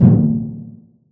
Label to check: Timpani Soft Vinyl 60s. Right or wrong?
Right